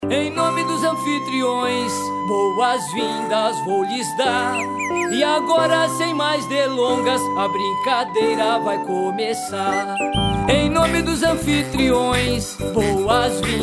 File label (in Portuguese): Canto de Boas Vindas